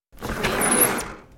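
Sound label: Slide Door Open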